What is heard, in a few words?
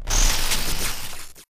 arc titan spark; Titanfall 2